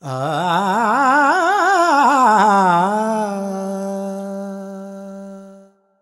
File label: Voice, Vocals Male, World Pop; Sonic Foundry (Sony Creative Software)